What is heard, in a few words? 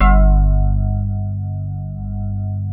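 digi rhodes, right; Sound Gallery - Charged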